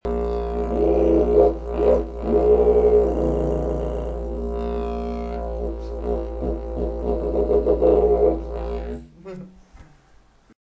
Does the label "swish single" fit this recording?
No